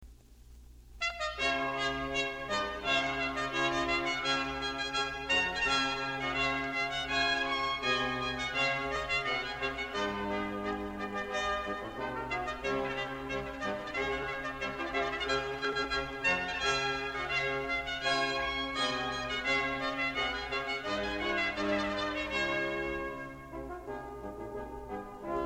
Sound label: Allegro Maestoso, Brass; RCA; Water Music